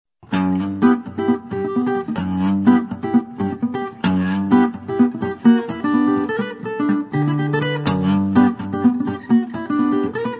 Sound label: Parlor Guitar